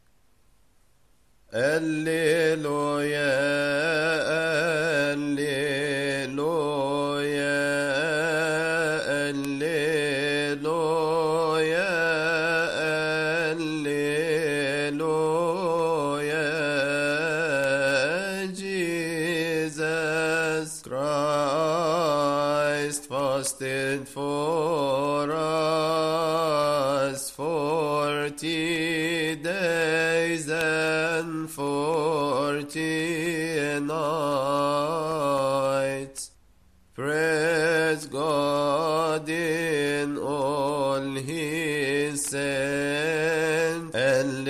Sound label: Psalm 150